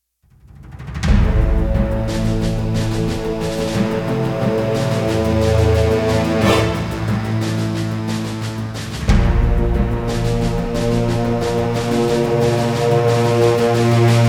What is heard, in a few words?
Bomb Airport TENSION